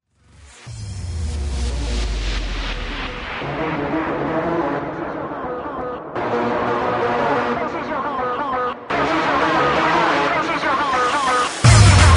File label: kickdrum trip; shanty remix; Electronica Exposed